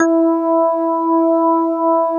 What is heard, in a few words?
METAL B